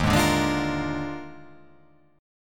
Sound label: Eb Major 7th Suspended 2nd Suspended 4th Eb; strummed; EbM7sus2sus4